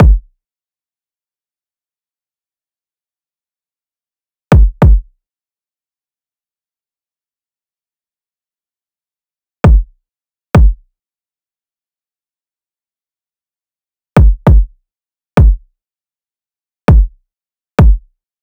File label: kick 100, Kick Loops; drum sample pack; Kavachi; SEISMIC; Slate